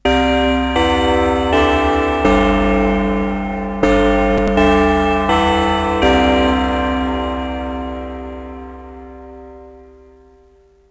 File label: bigben; baresip